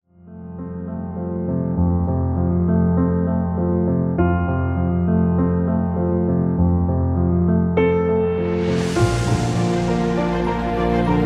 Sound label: Romantic Ringtone